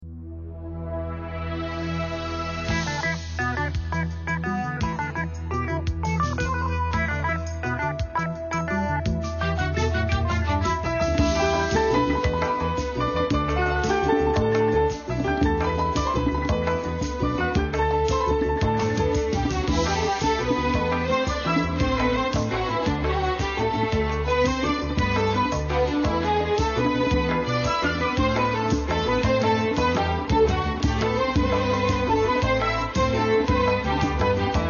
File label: Instrumental Music